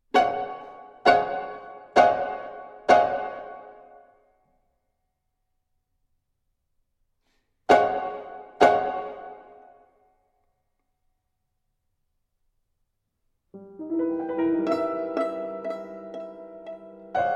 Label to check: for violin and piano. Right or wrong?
Right